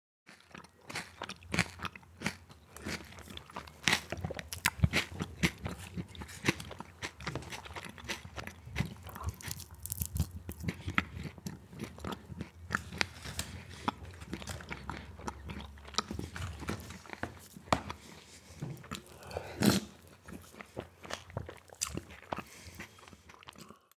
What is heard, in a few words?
Dinner or lunch eating food with fork and knife